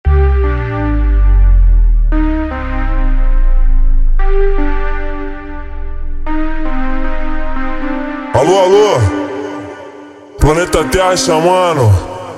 Funk slowed

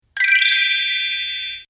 chime, ringtones; tsumtsum; Disney

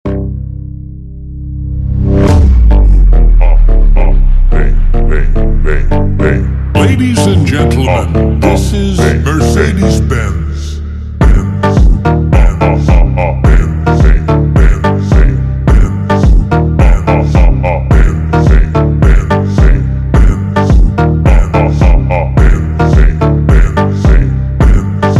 Funk over Slowed Reverb

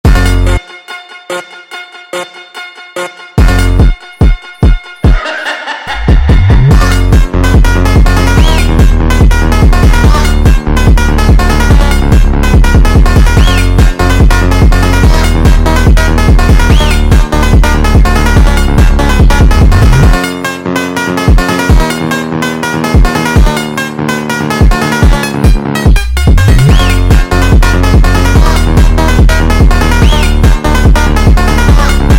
Montagem Instrumental sped Up

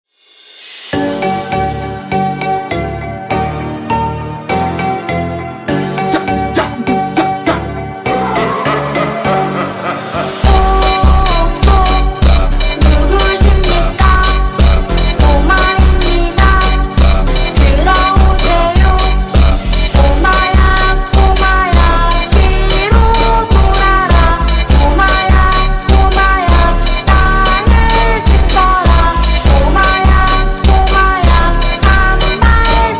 Funk Super Slowed

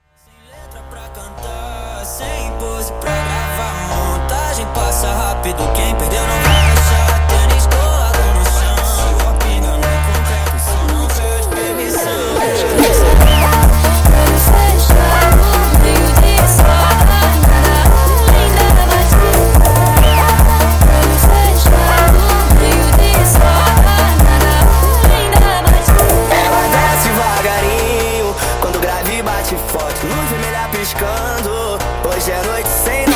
Montagem Jumpstyle sped Up

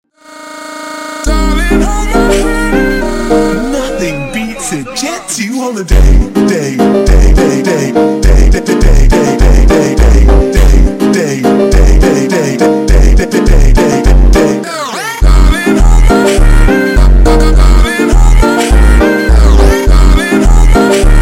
Funk super Slowed

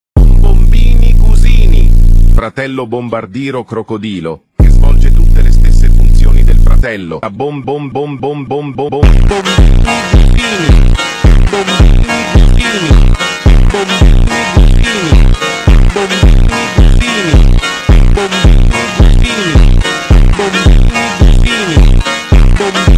Bombombini Gusini Funk over Slowed

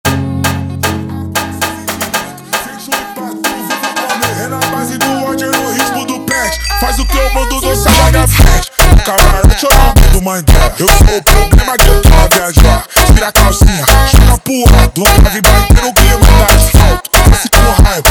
Funk super Slowed

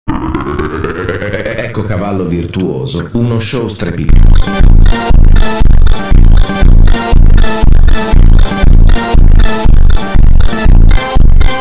FUNK superbass reverb slow

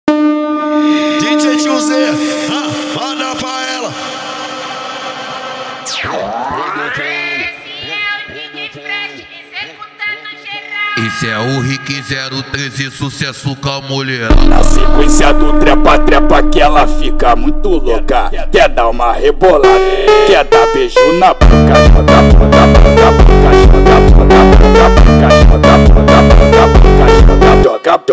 Phonk

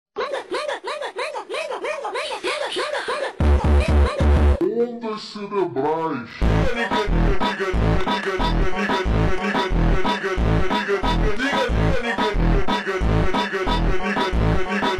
MONTAGEM FUNK (Slowed + Reverb) 3d rotating

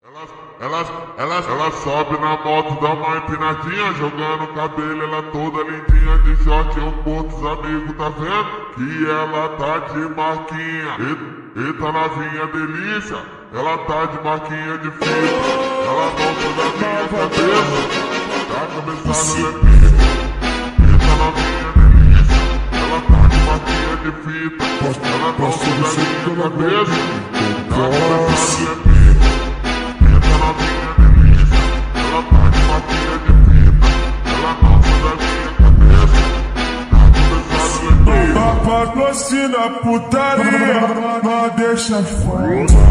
Phonk Slowed

